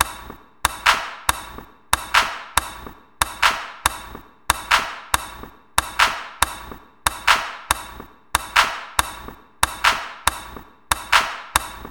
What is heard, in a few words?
handclap